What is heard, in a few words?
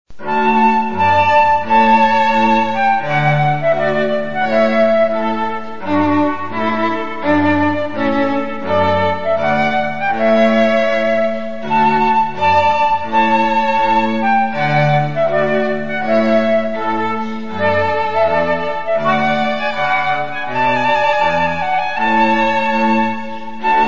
Trumpet Tune; Clarke